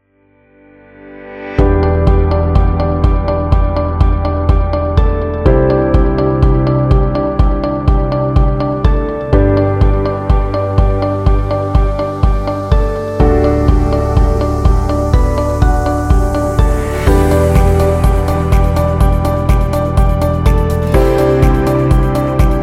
Inspiring Business

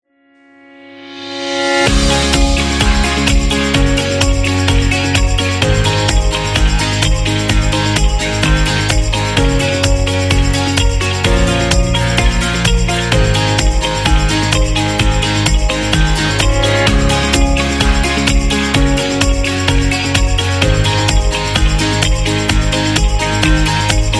Medicine Background

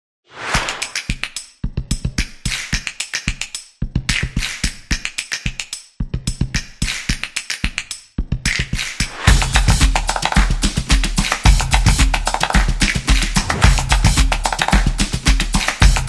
Urban Upbeat

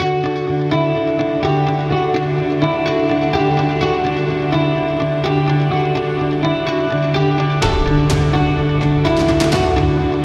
The Motivational

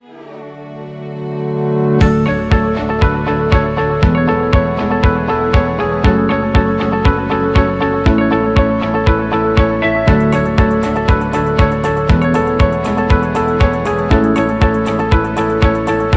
Corporate Tech; corporate music vol 10

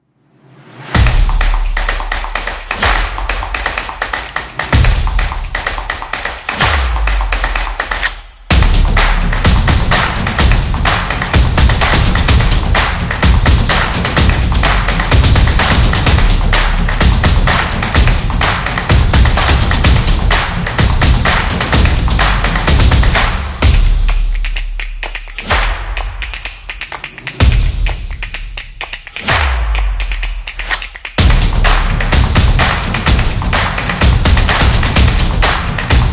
Drums Percussion; percussive nation vol 9

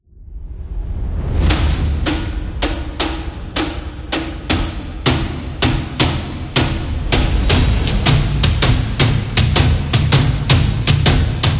Chasing, football music

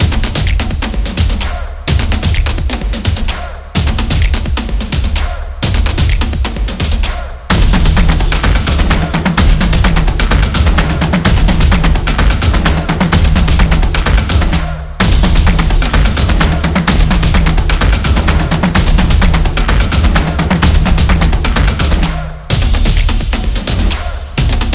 Upbeat Stomps

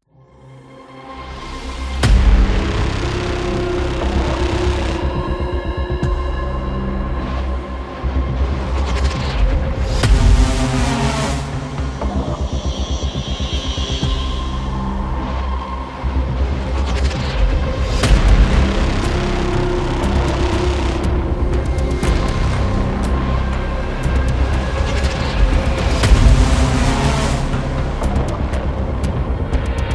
Thriller Trailer

